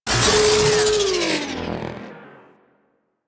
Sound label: Proto Infested Mutalist Death, Vocals; Warframe